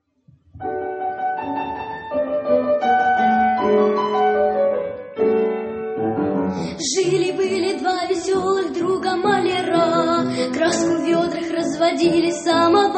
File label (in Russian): Хор первого класса; Д. Шаповаленко; Два веселых маляра